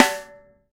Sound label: LD Rim, snares